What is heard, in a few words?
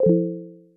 chime falling